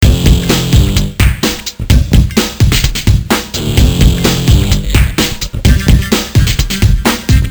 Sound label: depressing rock music